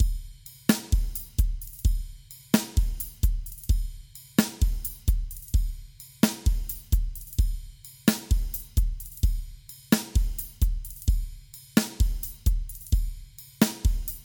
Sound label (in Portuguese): RITMO